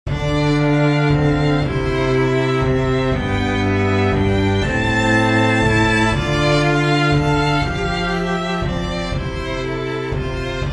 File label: soprano recorder with strings; Four scenes of medieval life as imagined by soprano recorder with strings and a stately bass drum; Dorian Suite; The melodies flow beautifully on the recorder; American Recorder Society